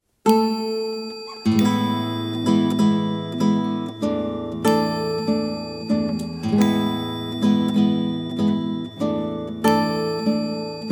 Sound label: Relaxing Bell